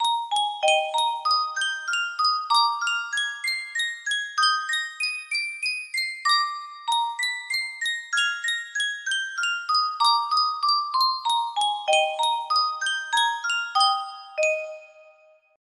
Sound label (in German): yunsheng spieluhr